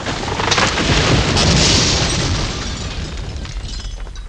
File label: crush; Total Chaos Nukem 3d Pack; duke